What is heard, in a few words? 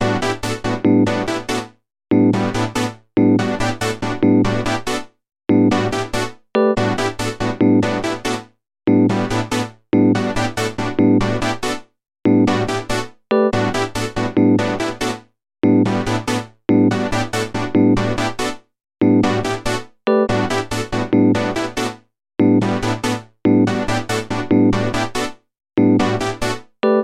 call mail; HTC; Infobar-A02